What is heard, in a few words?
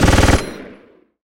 machine gun; Weapon